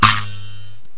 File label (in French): petit ressort; DoodleJump